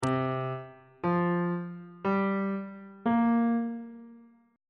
c dominant 7th suspended 4th chord intervals on bass clef